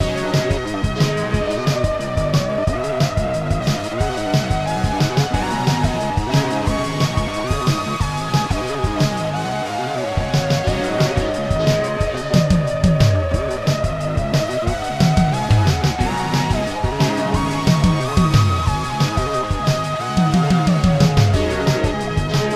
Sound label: Boss Music; High On Life; Krubis Boss Music A